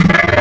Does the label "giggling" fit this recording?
No